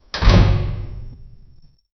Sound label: wooden apartment building